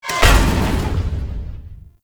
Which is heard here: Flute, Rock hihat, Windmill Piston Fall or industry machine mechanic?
Windmill Piston Fall